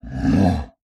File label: Animal Mammal Carnivore Cat Lynx Growl; animals; AdobeCC